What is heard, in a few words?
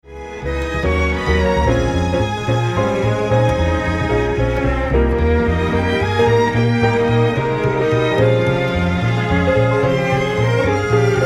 Strings Dual Bass On